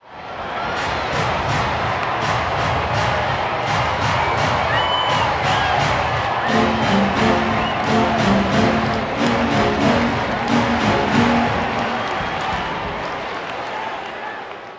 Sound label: Baseball Organ Crowd Claps; SFX Kit; Crowds